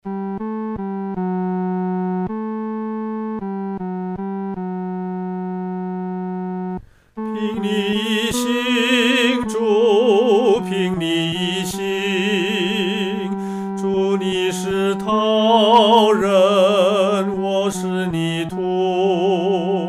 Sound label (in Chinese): 独唱（第三声）; 凭祢意行